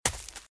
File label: Footsteps - Leaves - Run - Right; RadwinIsland